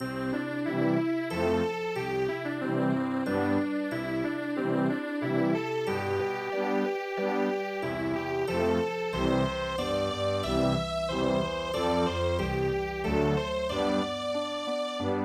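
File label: rainy moon wiener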